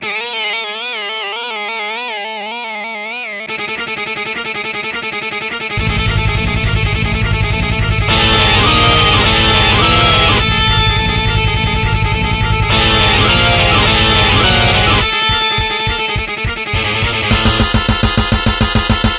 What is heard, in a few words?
Death Metal